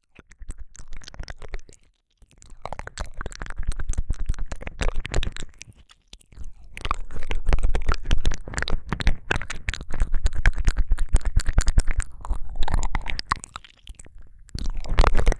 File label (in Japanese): ぐちゅぐちゅ系耳舐め, ASMR